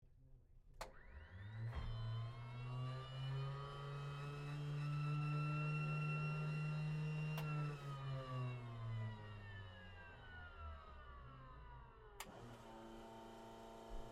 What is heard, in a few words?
AIRCRAFT CA-13 BOOMERANG STARTUP INTERIOR; Airplanes (Sound Effects:Vehicles)